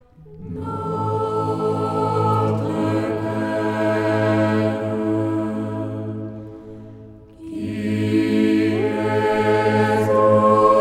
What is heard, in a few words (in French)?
Notre Père liturgie orthodoxe